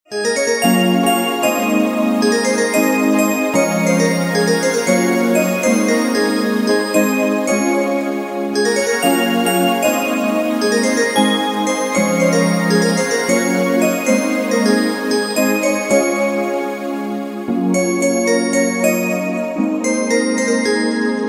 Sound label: Instrumental Version Ringtone